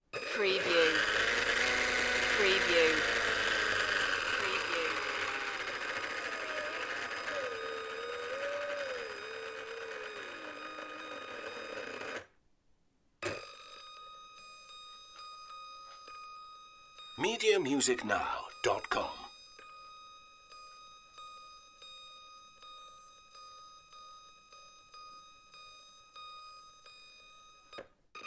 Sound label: propeller toy plane